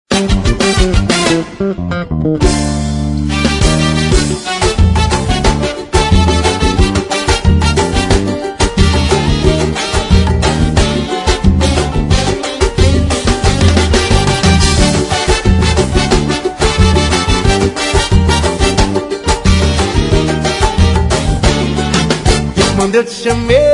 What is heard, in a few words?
Samba Merengue